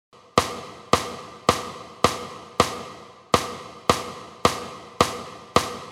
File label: Snare rimshot, Snare rimshot isolé; Piste 2, Snare rimshot, cercle de la caisse claire